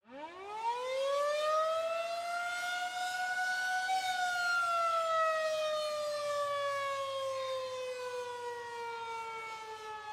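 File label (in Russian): громкая воющая протяжная далёкая предупреждающая эвакуационная сирена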